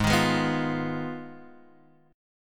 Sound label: Ab Minor 6th Ab, down, Guitar, Open F; strummed; JGuitar